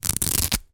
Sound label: foley baseball cap velcro adjuster strap rip; zapsplat